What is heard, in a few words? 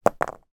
industrial small nail drop on soft floor